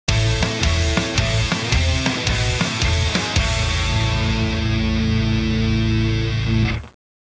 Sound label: lost round; csgom; cstrike